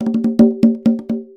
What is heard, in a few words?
Fill, Conga; musicradar